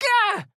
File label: AHurt